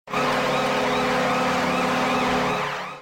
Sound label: goal horn